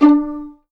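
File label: D4 pizzicato forte left, violins; East West; The Ultimate String Collection; VLNS PIZZ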